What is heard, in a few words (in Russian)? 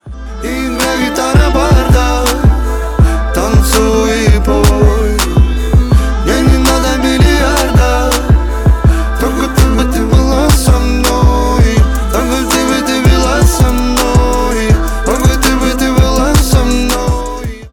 gitara